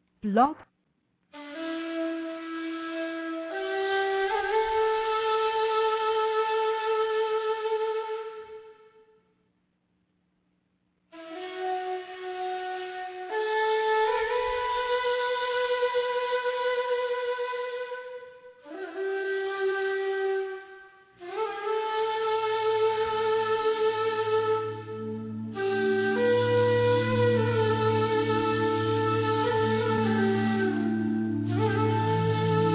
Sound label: thunder beat live chakra clearing sound healing